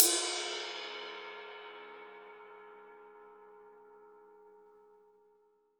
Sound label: RAW RIDE, Drums Acoustic; AKAI; 90s sample CD; USB Soundscan vol.10; RAW KIT 7